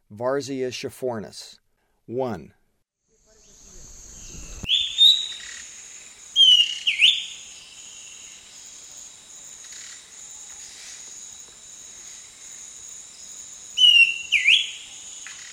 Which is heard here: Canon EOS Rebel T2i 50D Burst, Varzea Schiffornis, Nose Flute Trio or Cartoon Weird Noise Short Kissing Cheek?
Varzea Schiffornis